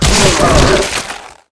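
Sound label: boss attack, monster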